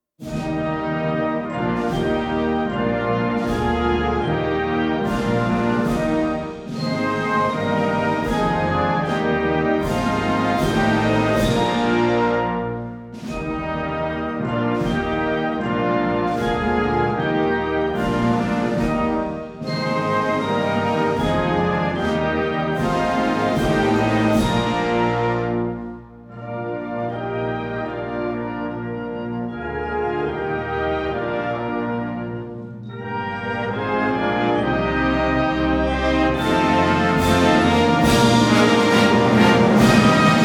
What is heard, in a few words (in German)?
Grosser Zapfenstreich Nationalhymne; Verfassungsviertelstunde